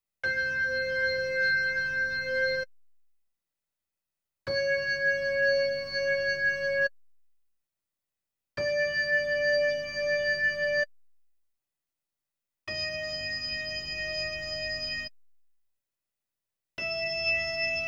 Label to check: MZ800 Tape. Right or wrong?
Wrong